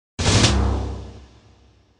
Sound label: Collect Grenade Egg; Banjo-Tooie